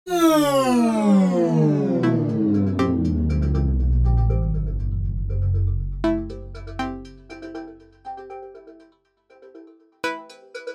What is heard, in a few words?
MIDI blues; spellman studio